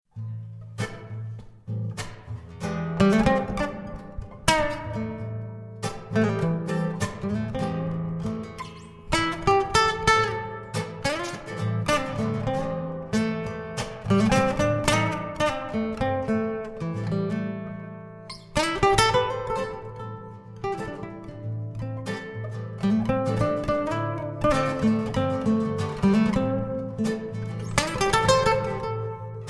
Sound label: blues